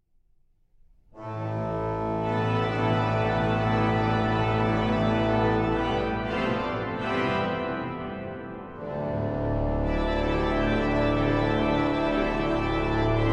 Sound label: Toccata